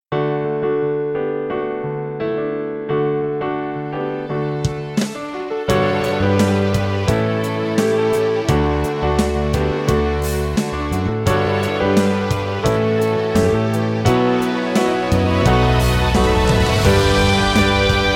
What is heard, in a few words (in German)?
Playback, Werbemusik